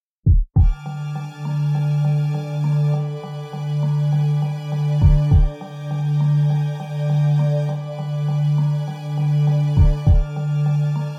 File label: Supercreepy; A Summer with the Shiba Inu; PS4